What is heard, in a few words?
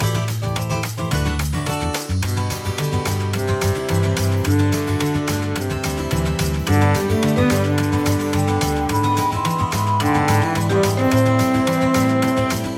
Grassland Theme; SMBW; Elephant Mario